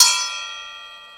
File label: Bell One-Shot, cymbal; KJ Sawka; Drum n Bass & Beyond; Drum Tool Box